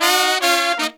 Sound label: Pop & Funk Brass, 110; Best Service ProSamples vol.25; AKAI